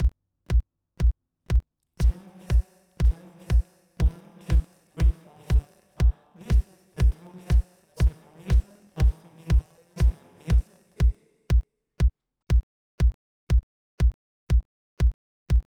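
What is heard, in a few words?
separated drum; sperated drum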